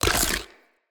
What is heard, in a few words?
Bite Small Impact Flesh, Enemy Unarmed; Weapon Impacts